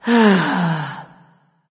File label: sigh; smite; voicepack; nuwa